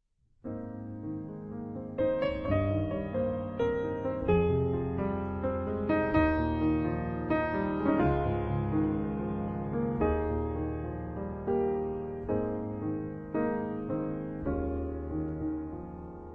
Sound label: Piano; Florence